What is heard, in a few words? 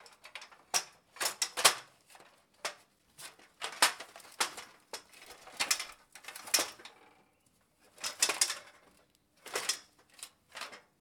Climbing Ladder